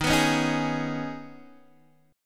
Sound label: Eb 7th Suspended 4th Sharp 5th, Guitar, DADGAD